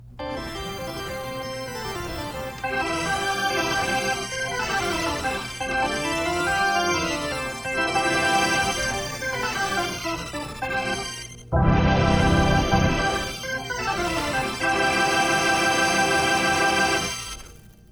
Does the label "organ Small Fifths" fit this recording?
Yes